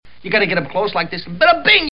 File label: badabing